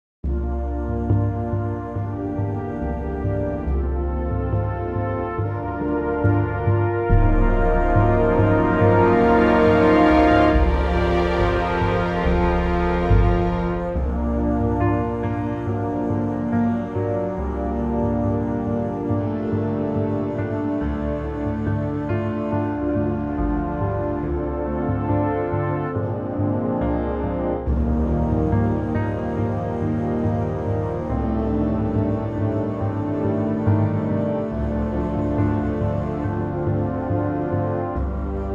Bb orchestra

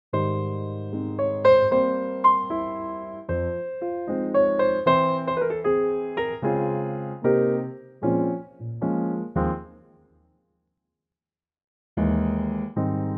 Ab piano

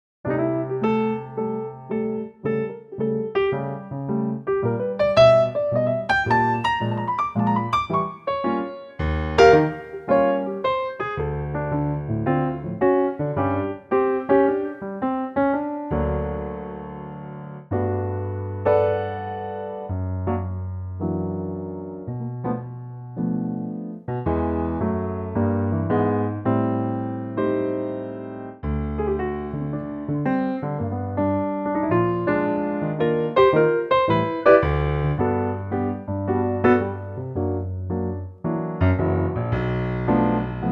piano G

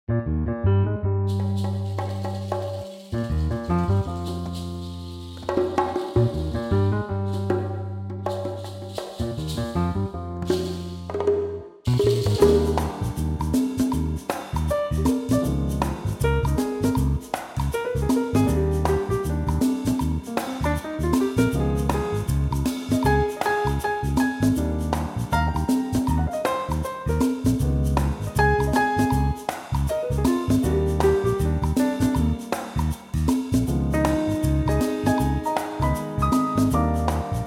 Eb trio

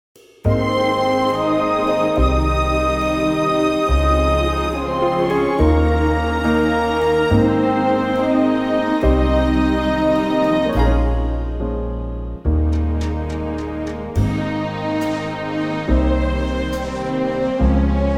Ab orchestral; key - Ab - vocal range - G to Db

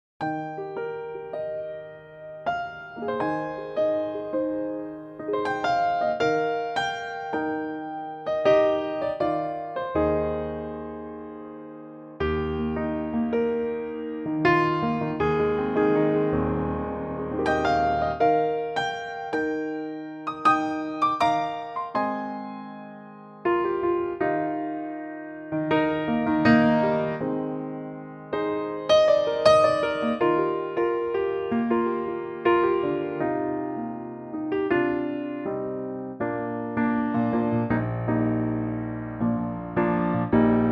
Eb piano

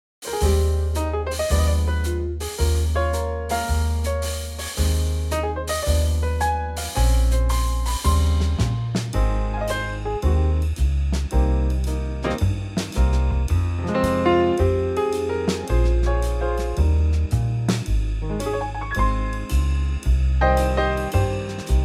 Trio Ab; key - Ab - vocal range - C to Db; Gorgeous old standard in a really lovely Trio arrangement, slightly brighter in tempo

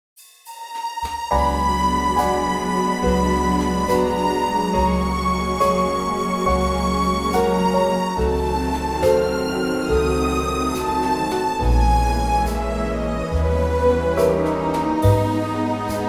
orch; key - Gb; Gorgeous orchestral arrangement of this popular classic standard

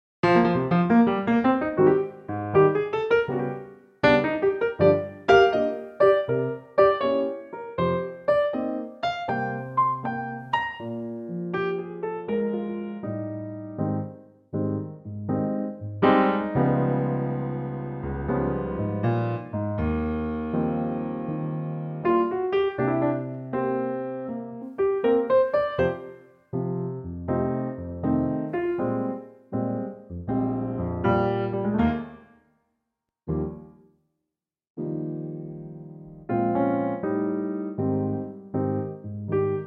Eb piano; Pianotrak; key - Eb; vocal range - F# to Ab; Wonderful piano only arrangement in a lazy swing tempo

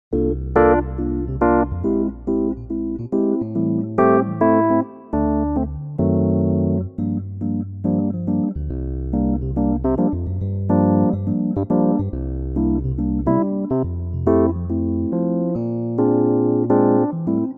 Electric Piano; key - F - vocal range - A to C (optional D at the end tag); Gorgeous electric piano arrangement of this classic standard

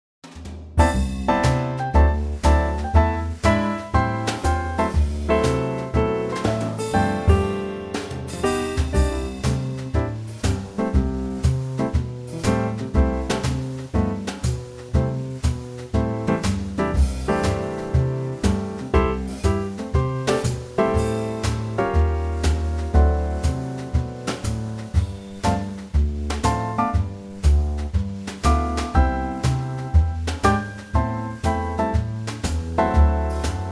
C Trio; key - C - vocal range - C to D; Superb Trio arrangement of this classic standard

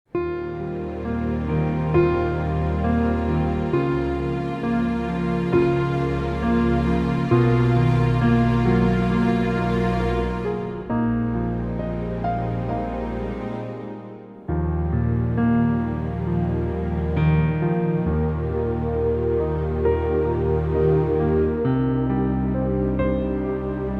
orchestral Eb; key - Eb - vocal range - Eb to G; Beautifully subtle piano and orchestral chords, staying in tempo but with orchestral movement

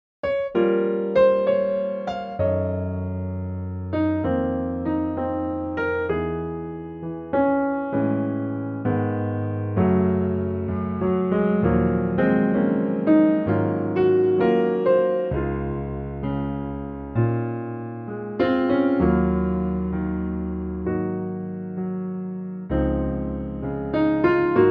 piano

